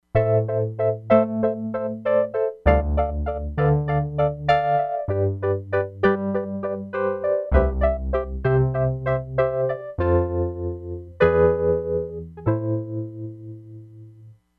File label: WURLITZER ELECTRIC PIANO, Crumar Bit 99; Factory Programs